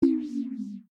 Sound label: downgrade; vex5